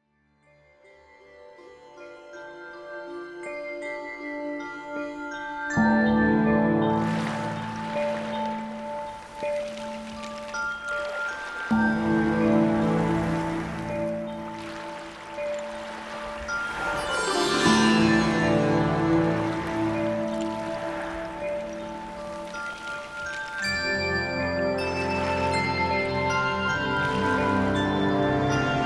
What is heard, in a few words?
Ambient